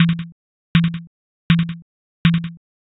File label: fs gym